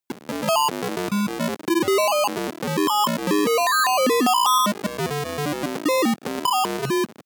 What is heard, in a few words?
broken computer trill